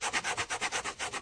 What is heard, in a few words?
drawing; curiousgeorge